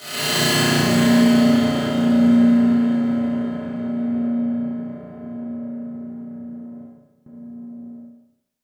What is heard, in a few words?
Transition Stab Dark Metallic; Production Element; Title Transition; AdobeCC